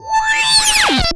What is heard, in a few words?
Roland C Noise, Roland S100; musicradar-synth-samples